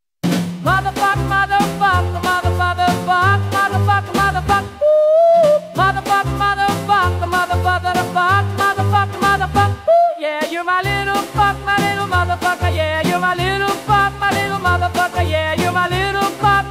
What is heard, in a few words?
doo wop funk